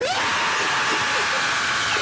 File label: exploded house